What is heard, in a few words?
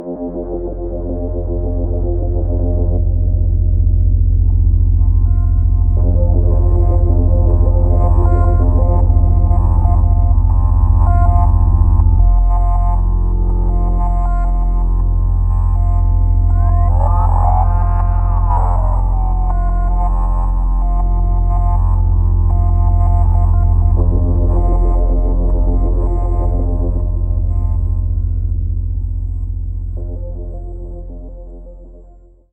heli flight